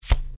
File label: card flip; effects